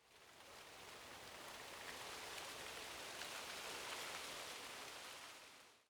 leaves

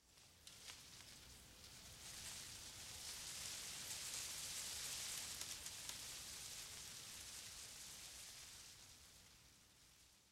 grass

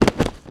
hit

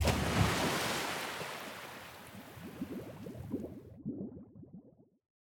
splash

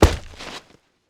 hit

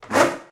revert

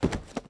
hit

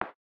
ui move

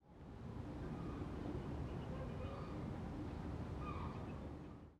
bird

